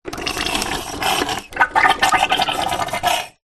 household bleach squirt from bottle into toilet bowl